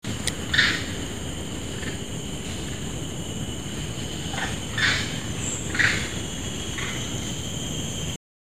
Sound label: long tailed macaque, Mammal calls; Macaca fascicularis; (Alarm call); Upper Peirce Forest, Singapor